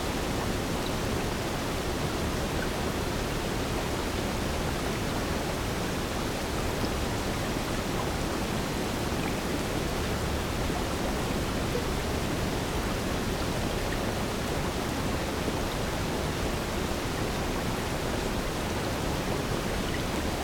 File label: rain